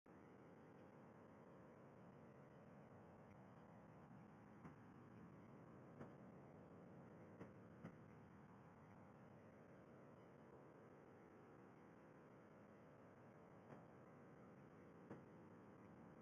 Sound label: background noise